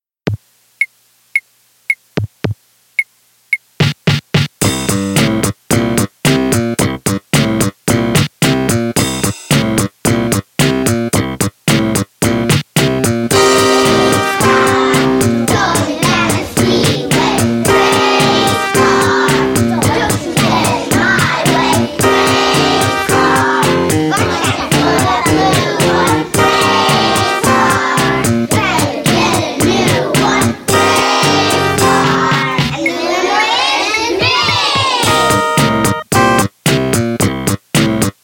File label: Race Car Song